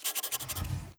drawing move

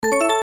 se learn; End of Aspiration; android

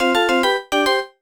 Jingle; Imagine Master Chef; Imagine Happy Cooking

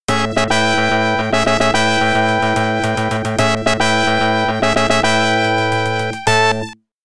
Battle Victory; Romance of the Three Kingdoms 2; X68000

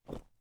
Snow

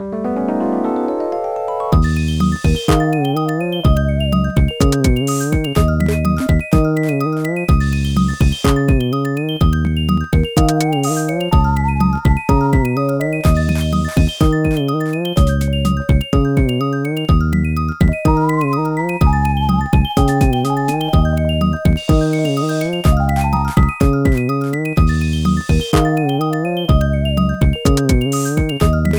Mini Game Medium; zoo frenzy; dsiware; nds

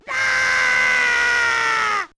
A Terrifying Scream; Rayman Raving Rabbids; gba

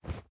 Cloth Run

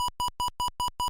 Faster Clock Alert; nes; extra mario bros. super mario bros. hack

